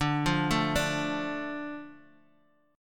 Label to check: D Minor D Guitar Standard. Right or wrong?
Right